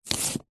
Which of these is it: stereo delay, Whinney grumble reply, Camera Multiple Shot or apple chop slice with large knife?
apple chop slice with large knife